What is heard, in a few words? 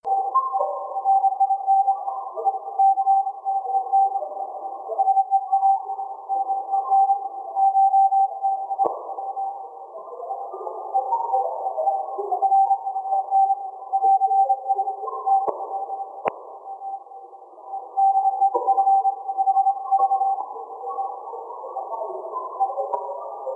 weak CW processed